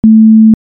sin 220Hz

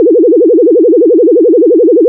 vibrato

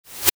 noise reverse

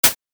noise attack

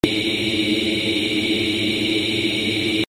noise E

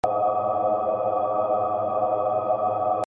noise A

